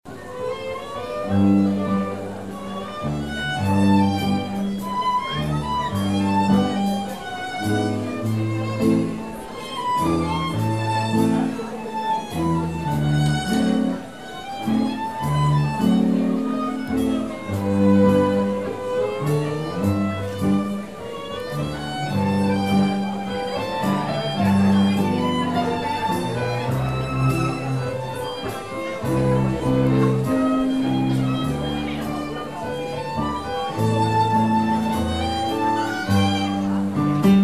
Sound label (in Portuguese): Piano e Cordas